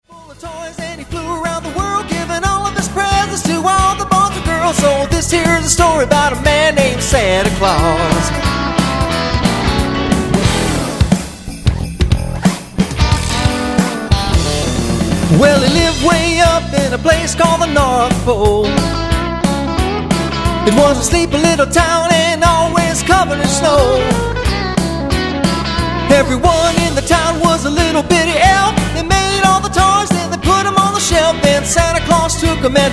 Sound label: Santa Swing